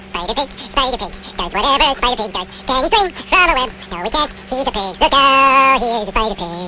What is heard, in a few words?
Speed-up